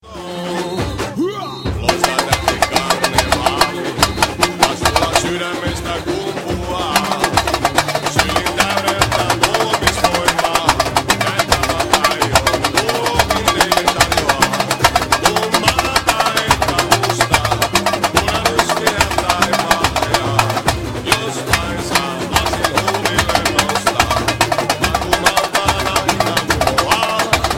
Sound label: samba tamborim